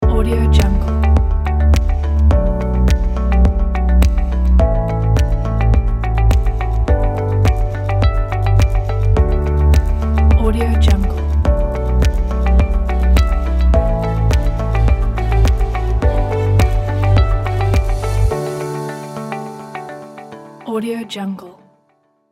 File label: ambient corporate ident